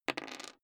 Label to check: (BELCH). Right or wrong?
Wrong